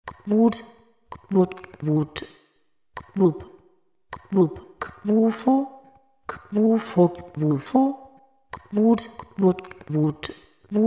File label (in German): jodler